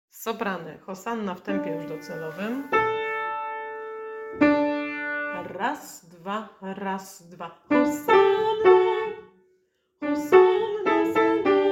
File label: Sopran; Wielkanoc; Hosanna; synowi dawidowemu